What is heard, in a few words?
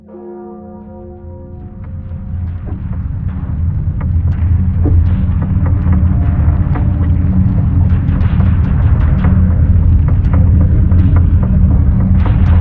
Death Approaching Ambience